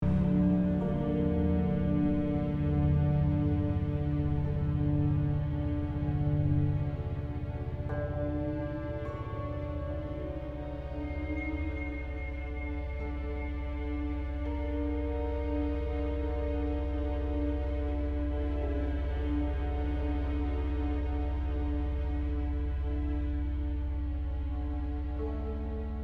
Exploration (Loop)

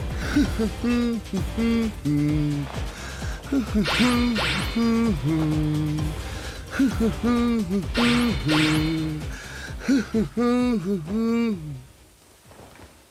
Humming; Hyrule Warriors Definitive Edition; Ghirahim's Humming; Demon Lord Ghirahim

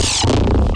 BLiNKiNG dist

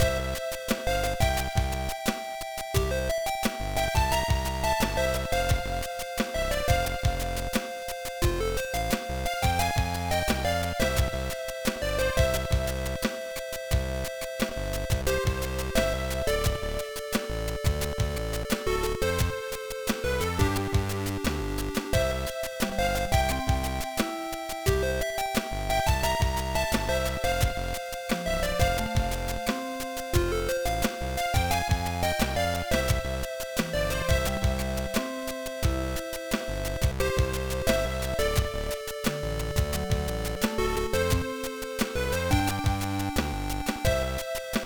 BGM; Zero One; gba

